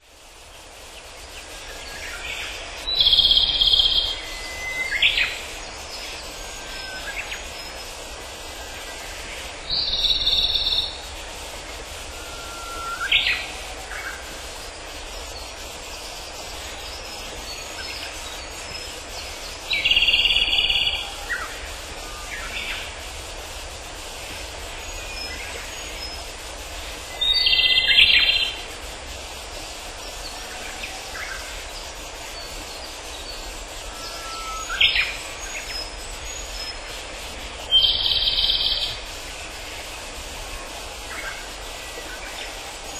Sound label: chorus